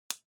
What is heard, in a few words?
Pocket Watch Crown Pull Up